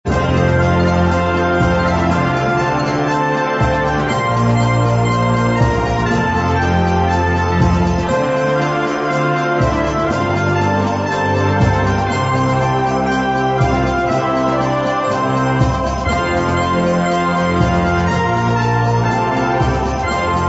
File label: Hark the Herald Angels Sing; xmas; bg-music